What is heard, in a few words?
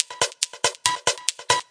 140 bpm, techno videogame highs, drum loops and breaks